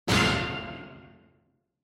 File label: Quiz Question High; クイズ; 出題